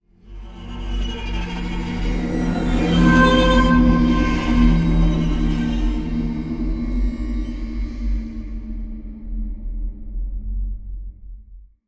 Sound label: Mine Tension; Outlast 2; PS4 Windows Xbox One